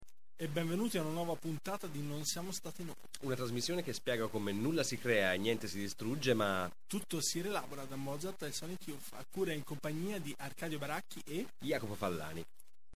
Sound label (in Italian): distorta; americana